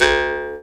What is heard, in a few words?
D JAW, Primitive Instruments